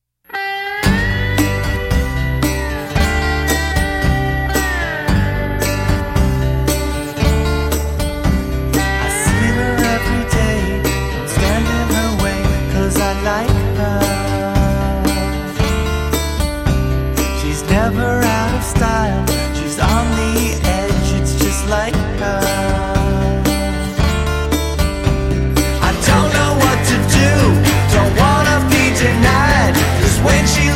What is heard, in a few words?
Acoustic Version